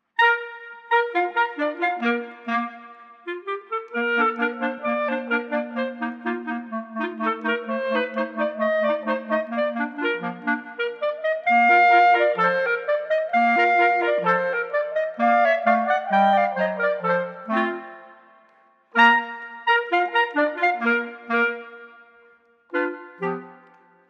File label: Clarinet Trio